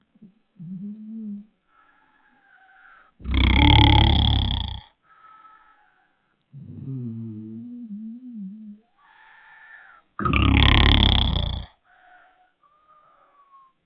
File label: 5 years old boy, bedroom